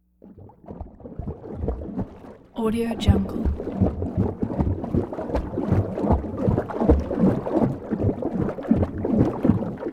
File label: sports swimming water fins swim fast approach; شنای سریع زیرآبی; human sounds